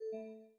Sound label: Windows Hardware Insert; GMM Windows 10